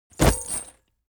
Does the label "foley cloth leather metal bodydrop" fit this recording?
Yes